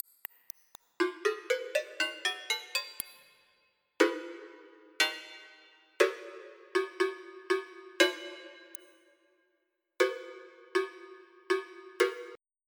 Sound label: Hand Bell